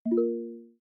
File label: alert